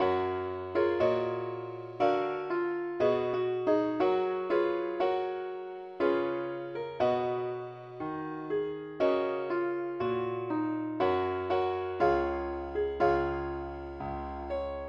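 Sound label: ukelele key G flat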